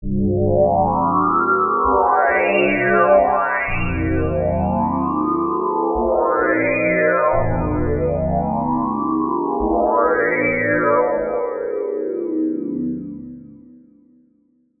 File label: ZONE DRONE; eShop